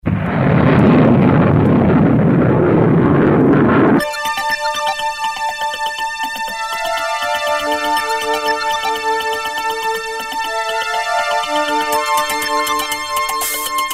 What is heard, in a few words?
DISCO